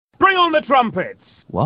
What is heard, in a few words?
Trumpet